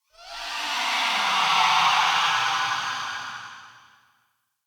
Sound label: Amb Wraith Night Screech; Ambience; Mendicant Area